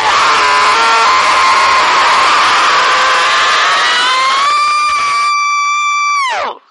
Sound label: scream distorted; Voice